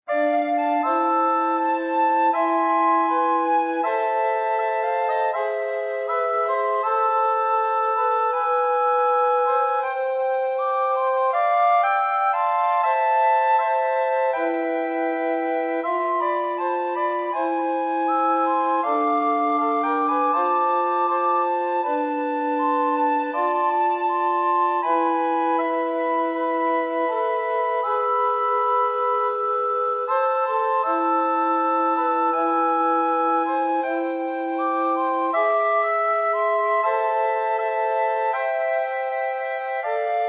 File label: SSAT Recorder Quartet